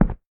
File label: Tile short; Devour 2021 gamerip pc macos